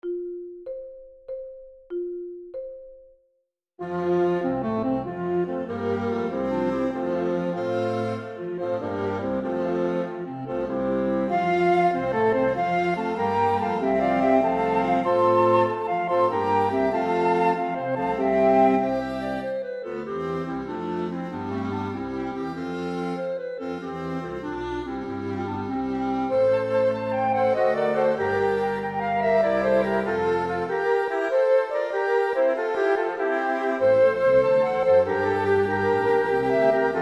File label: symphony f dur vivace ma non troppo; boyce